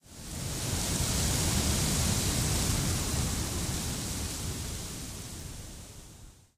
new wind, nature; STALKER; IWP

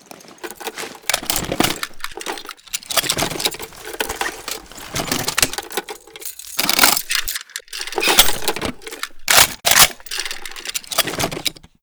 pkm reload empty, weapons

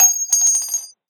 Shell heavy default; STALKER; IWP

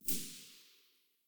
aug silencer mono very far, weapons; STALKER; IWP

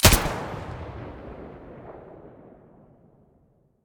aug muzzle stereo, weapons; STALKER; IWP

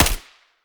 sa58 silencer mono distant

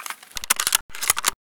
bolt, sv98

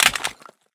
pistol drop tile; STALKER; IWP

